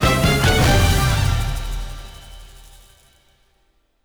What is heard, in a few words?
You Got An Item (End String Only); blitz brigade; android